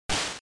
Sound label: General Noise Single